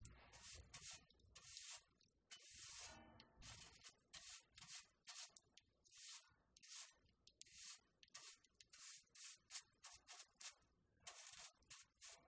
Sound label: Woodstove Tools Whisk Broom And Dust Pan Sweep; uberduo